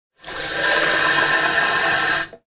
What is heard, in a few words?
household tap turn on and water fill up plastic pot